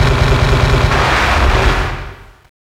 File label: WAR FX; Hard & Loud Techno; USB Soundscan vol.01; AKAI